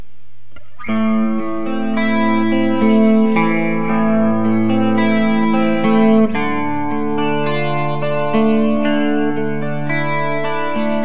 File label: bb2 neck clean specpick; burny; fugly clips